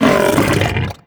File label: pull grunt, barnacle creatures; Half Life Alyx